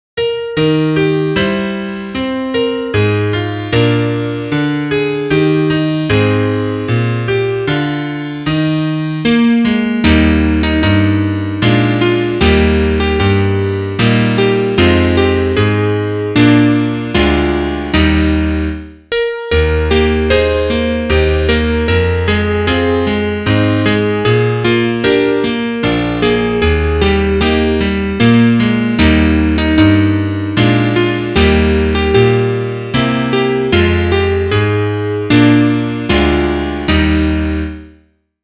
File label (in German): Klavier; Liederbuch; o tannenbaum